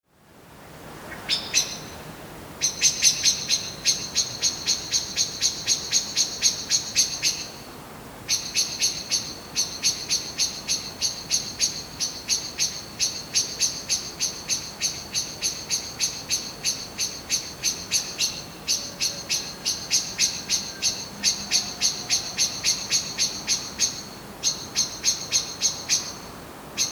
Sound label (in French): oiseaux